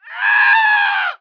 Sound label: burn dying; Emperor - Battle for Dune